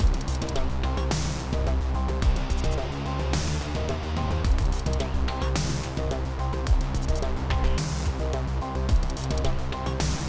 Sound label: Combat, 108BPM